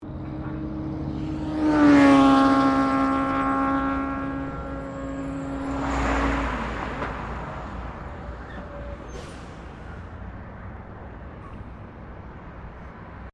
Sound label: Traffic Motorbike Pass By; General traffic sounds; Backgrounds; Traffic and Cars